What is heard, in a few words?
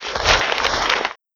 Unwrap